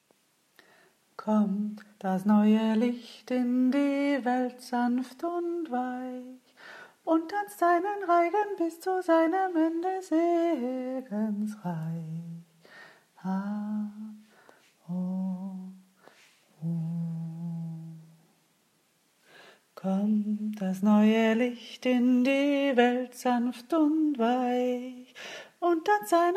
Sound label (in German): dreifaltige percht kanon